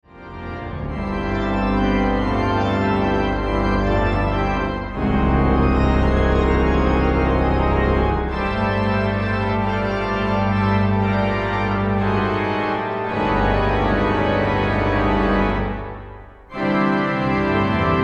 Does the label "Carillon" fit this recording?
Yes